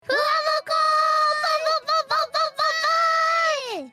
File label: bau bau wau wau